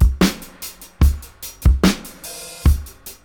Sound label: 73, Dub, DRUM LOOPS 073 BPM; Drumdrops In Dub VOL-1